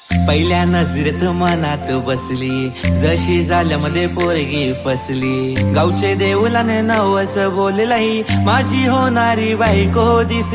Love Song, Marathi Ringtone

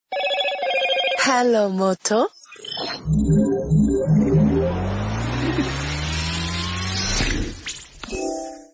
Motorola Ringtone

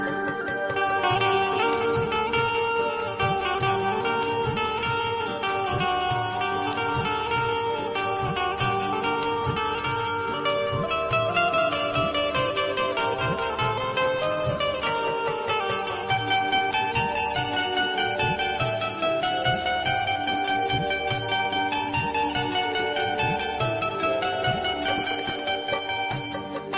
Classical Krishna Bhajan Instrumental; ringtones

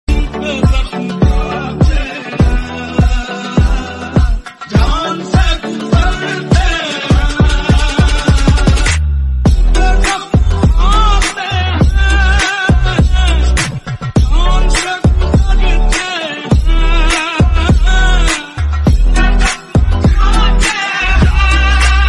Slowed Reverb

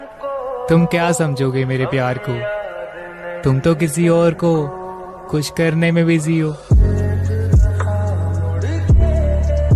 Sad Breakup Hindi Ringtone; ringtones

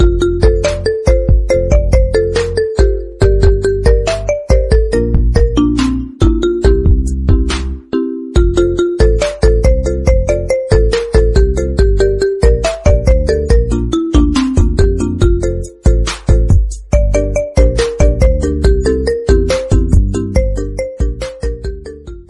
iPhone Remix Ringtone; Nobita Doremon X iPhone

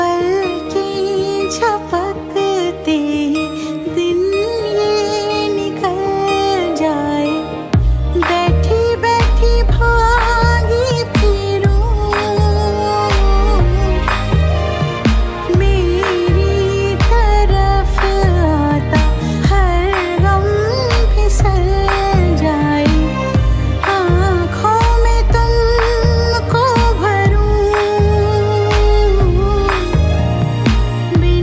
Female Version Ringtone

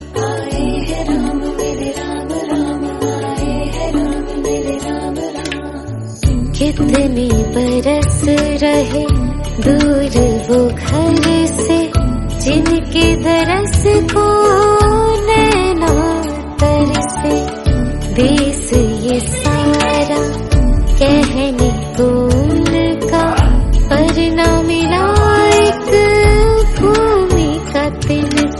Bhakti Ringtone

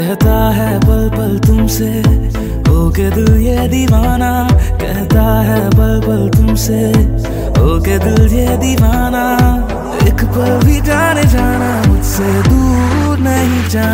Hindi Ringtone

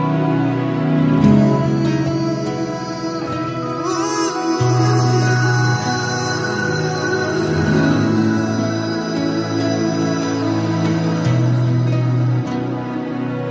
Bollywood Movie Music

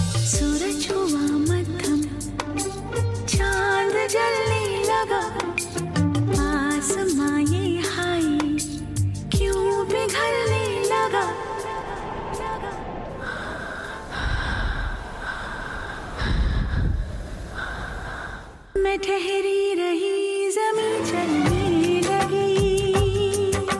Old Hindi Song Ringtone

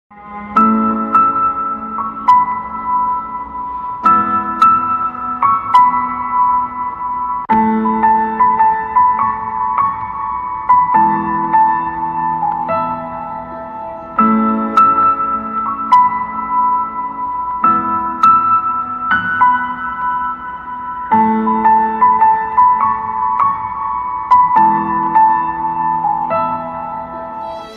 Piano Instrumental Ringtone; ringtones

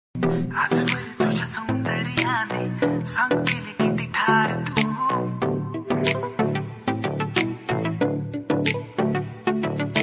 Marathi Ringtone

